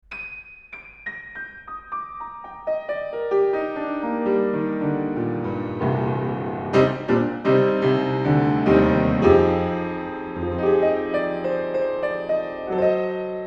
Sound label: HF Beam Piano Front Open